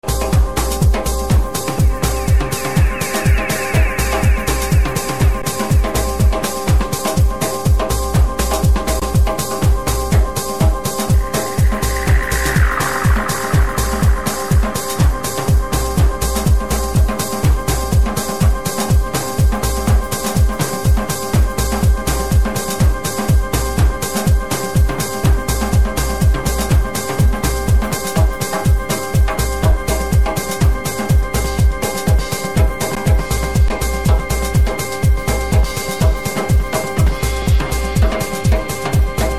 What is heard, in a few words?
drums on parade